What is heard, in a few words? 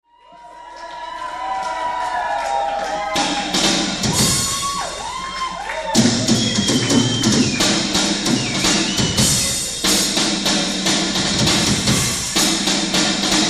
drum solo